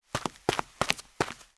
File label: walk fast